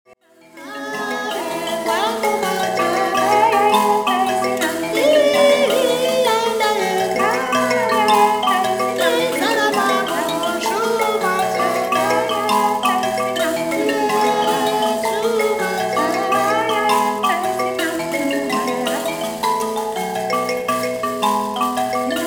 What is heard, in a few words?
mbira